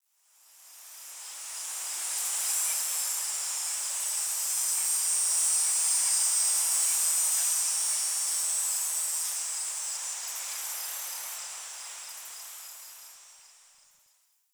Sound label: Tree Cicada; Warframe; Ambience; Nineteen Ninety Nine